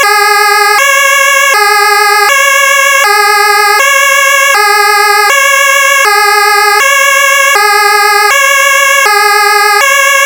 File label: pintsch bamag Stadt